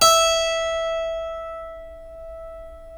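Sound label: E4 clavinet right, Keyboards Acoustic; USB Soundscan vol.17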